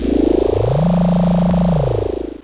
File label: Maximize Restore Up